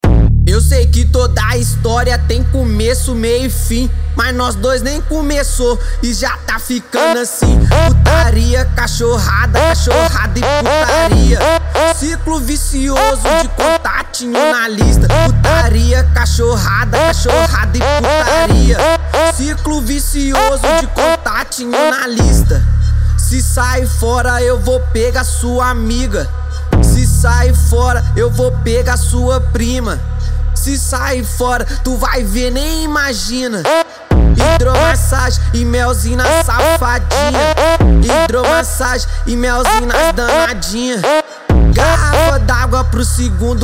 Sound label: funk